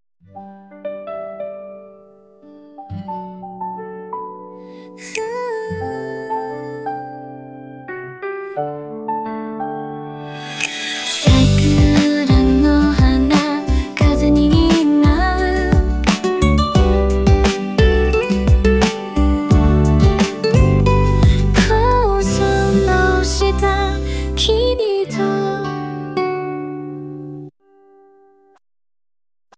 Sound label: vocal ja ballad